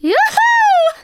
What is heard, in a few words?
Emote Cheer; Twitch Skijump